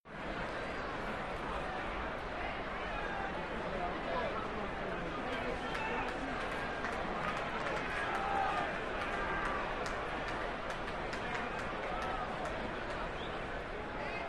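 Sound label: Arena Crowd Ohs Cheer; sports arena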